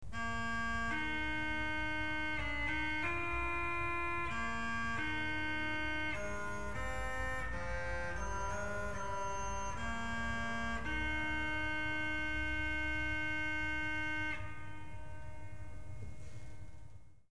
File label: krumhorn